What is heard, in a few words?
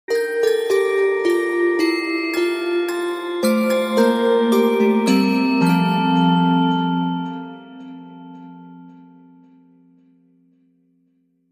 Twinkly Away In A Manger